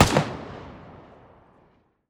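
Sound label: famas distant, gun; arccw go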